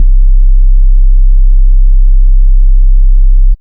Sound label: kick, Big Beat Samples; Big Beat Samples & More; AKAI